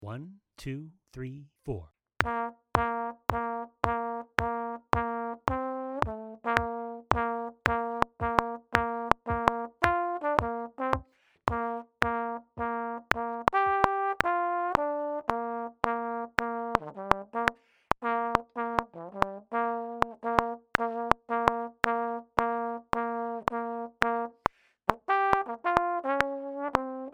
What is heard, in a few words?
Metronome Playing